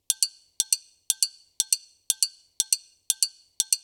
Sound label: 120 China Bell, Percussion; Loops; Touch Loops; CosmicFunk